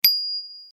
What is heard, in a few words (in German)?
Fahradklingel; skater Bell